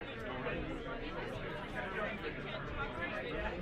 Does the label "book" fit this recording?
No